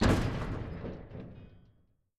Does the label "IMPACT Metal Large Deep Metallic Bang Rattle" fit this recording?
Yes